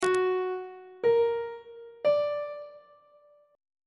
f sharp augmented triad chord intervals on treble clef